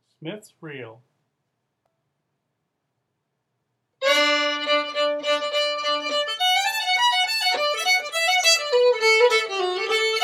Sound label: Reel, fiddle; festivaltunes